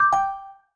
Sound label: message Received